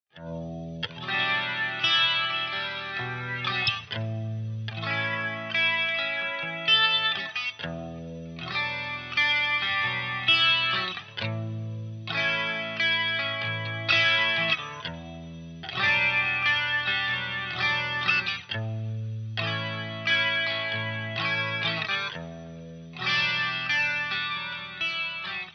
subtle stereo, flanger; audyo engineering

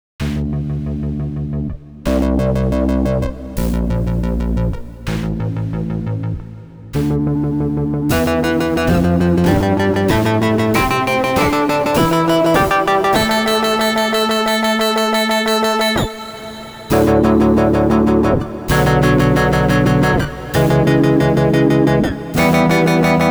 saw and choir seq; the digital life

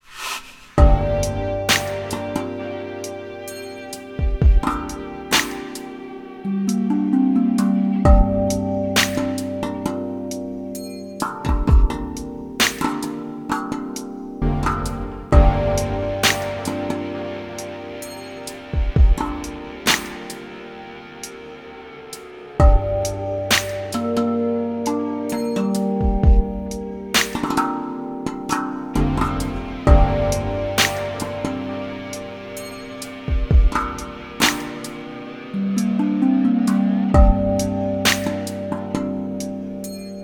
space drum; root sounds

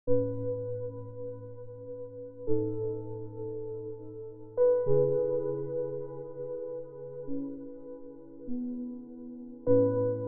ambient piano; ambrosium

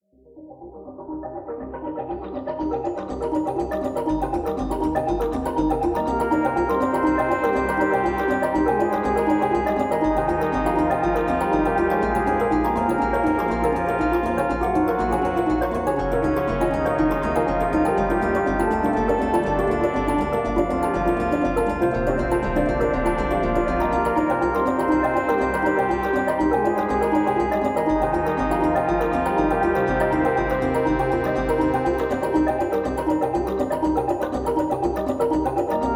glass texture; dream kit zenith; majetone

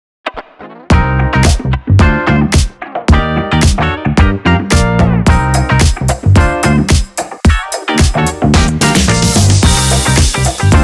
dance mode transpose; the commodore; authentic soundware

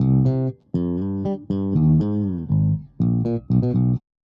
bass enhanced; modular effect board; sonic instruments

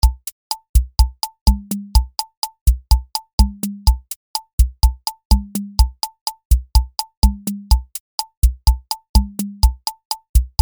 cha cha; tiny pops; superwave

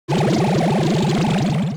filtered bubble up down; mario; navigation button fx; nova sound

